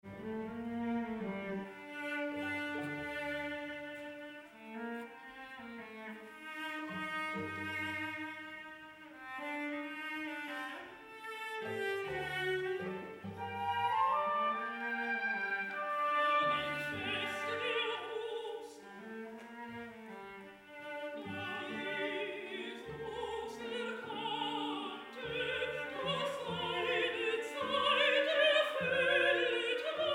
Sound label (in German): Rezitativ Erzaehlerin Jesus Simon; Die Verleugnung